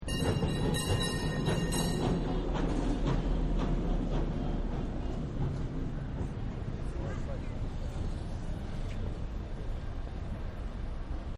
San Fran Cable Car Bell Stop; Streetcars; Vehicles; Partners In Rhyme

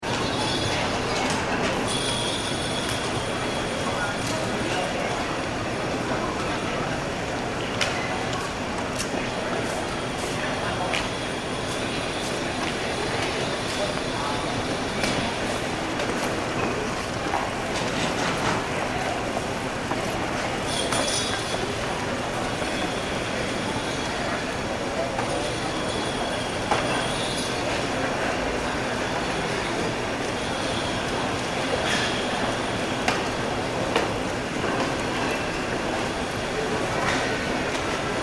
ambience airport check in hall; John F. Kennedy (Jfk) Airport; Airports; McKinney Sound

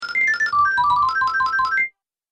Super Bonus Points, video game

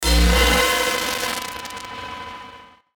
Glitch Electrical Pulse; glitches; Blastwave

